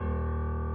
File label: key; keySounds